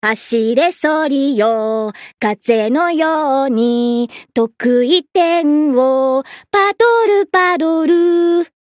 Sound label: jingle bells